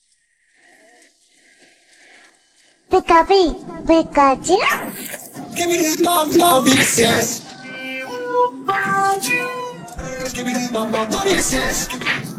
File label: vocals